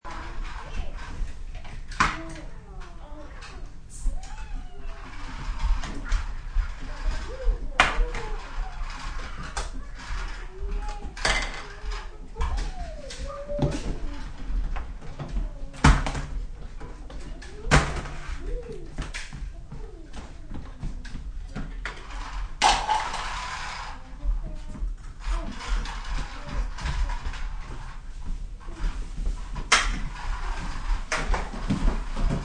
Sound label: Kids Play Toys, Kids playing; Kids Play with Toys; Playgrounds